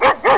bark

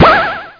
Zap; FRACAS 1.2.1; Fracas Sounds

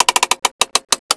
bomb priming; Spearhead Demo; MacFormat 2003 June

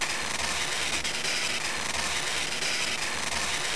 scratch; Easy Writer 3.5

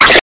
Sword Shing Bright; The Hollywood Edge; Combat

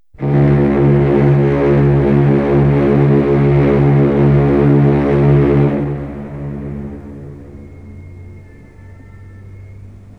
SHIP HRN; L'Effet Pommier - Volume 04

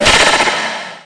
blow; RealCarSim

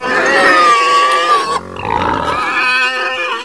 PIG; mtm2demo; PC Gamer 4.2

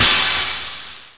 Thunderclap; Amiga-CD 1996 #5-6; sampler; drums

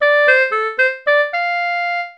Clarinet

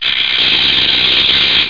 repair

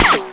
impact; SpecOps2MPlayerDemo

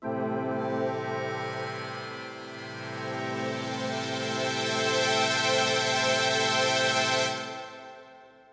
sting; Corel CD Power Pak: Sound Clips

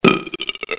burp; Gold Medal Software - Volume 3 (Gold Medal) (1994)

